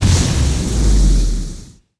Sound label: Door Lock Break